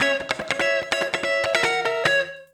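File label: guitar, Drum & Bass, 145 BPM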